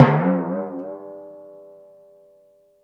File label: TIMP, cartoons